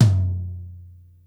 tom floor, Yamaha Motif ES-Series; 300 Drum Machines

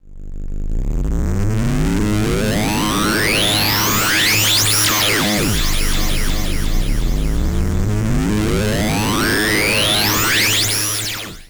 SYN, Drum & Bass; Best Service ProSamples vol.03 - Drum & Bass 1; AKAI

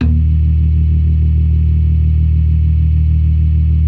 DRAWBAR VIB, Synth and Organ

